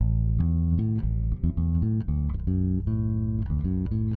Electric Bass; Basses

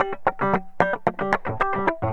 Electric Guitar, Loops; Guitars

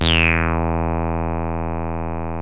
bl saw, yamaha rm1x synth bass and lead

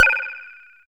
player pickup item; theGoonies; Retro

